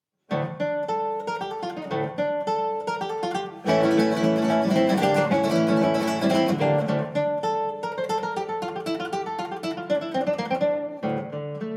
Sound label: sonata vivo